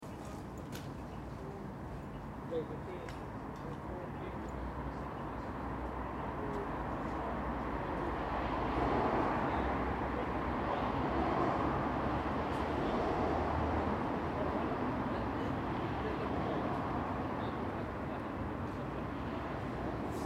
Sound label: City Calm Voices Cars Evening, Voices next to moderate town traffic. Calm enviroment.; Faint sound of motorcycle driving in distance